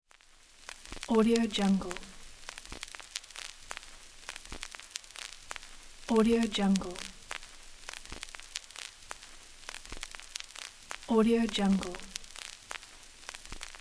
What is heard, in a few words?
vinyl